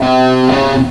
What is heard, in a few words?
Shark Patrolling; Barrack 1.0.0; Barrack Sounds